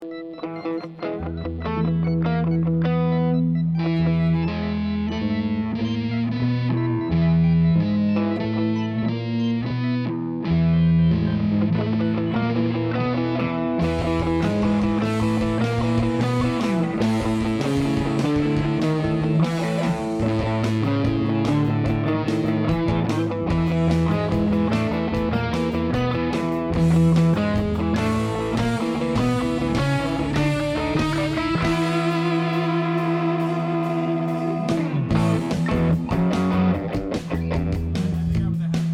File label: Strings Only; going over the climb